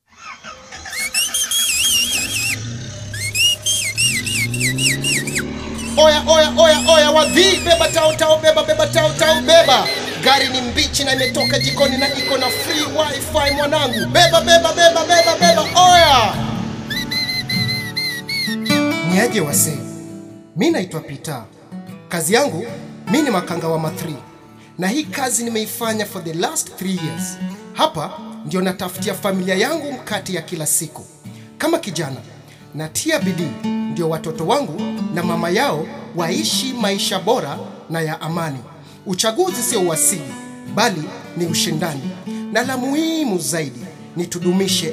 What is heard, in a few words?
Bus radio peace jingle; Makanga wa Mathree